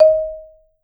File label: D#4, Marimba